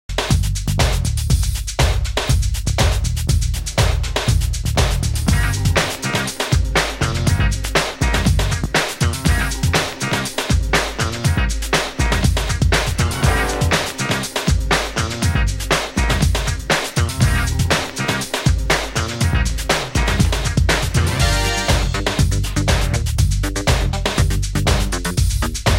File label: instrumental